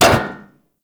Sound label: Ship Hit; RailJack Hit Effects